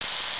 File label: welder WELDING quick; foley